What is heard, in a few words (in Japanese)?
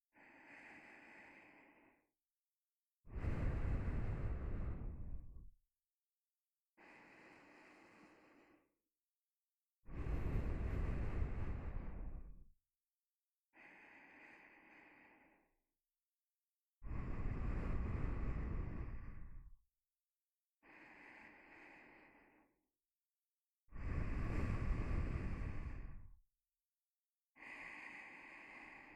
添い寝鼻息